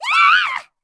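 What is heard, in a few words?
ghost attack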